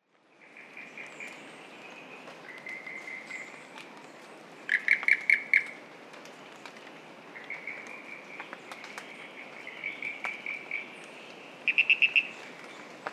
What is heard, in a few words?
Ischnocnema holti